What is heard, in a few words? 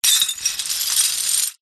impacts dish ceramic pieces drag ground in cloth bag